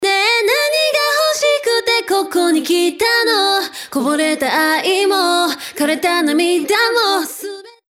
doubler; waves

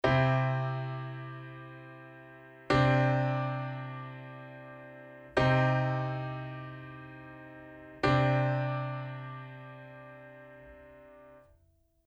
Csus2 Cmsus2

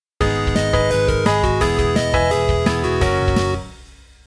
Piano

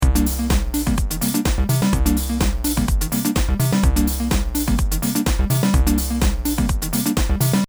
bass down

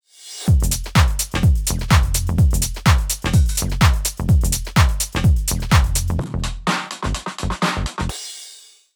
break

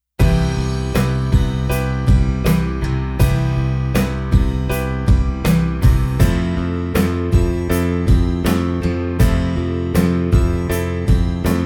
cmaj7 fmaj7 g7 c

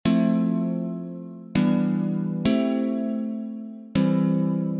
legato

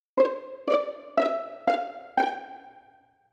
pizz

